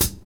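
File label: hi-hat, Techno Rock; Northstar; Drumscapes Roland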